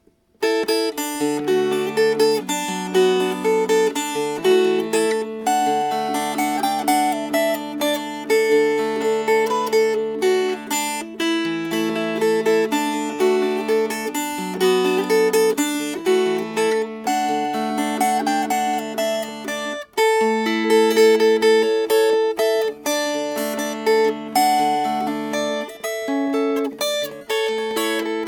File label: Fingerpicked; Cumberland Waltz